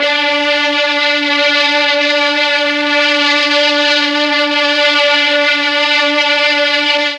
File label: D2 16 VIOLIN, mellotron; TRON 16VLNS